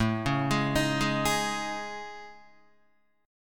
A Major Sharp 11th, Guitar; arpeggiated

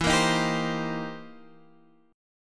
C Minor 7th E; Cm7/E strummed

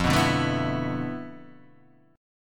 D Minor 6th Add 9th F, guitar chord

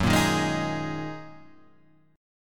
Gb 6th Add 9th, Guitar Drop D; chordshape; down

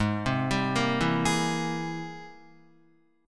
Ab Augmented Major 9th Ab Guitar; arpeggiated; Ab+M9; JGuitar

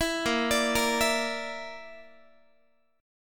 Bb Major Flat 5th, Guitar; BbMb5 arpeggiated

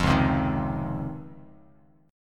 C sharp Major 9th C sharp, Bass Standard; C#M9 strummed; JGuitar

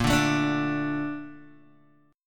Bb Major 7th, Guitar, Open D

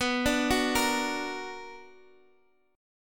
B Minor Major 7th, Guitar, Half Step Down

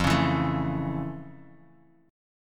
F Augmented Major 7th, Guitar, Open D

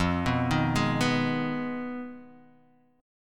F Minor 7th Flat 5th; Fm7b5 Chord {1 2 1 1 0 X} arpeggiated

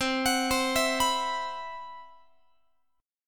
C Major Sharp 11th, Guitar; CM#11 arpeggiated

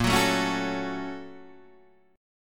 Bb Minor Major 7th Sharp 5th, Guitar, Open D

